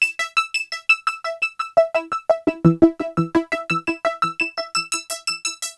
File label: stairs, korg